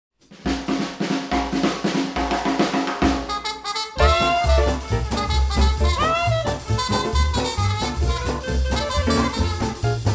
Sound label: 20s 30s jazz; 20s Band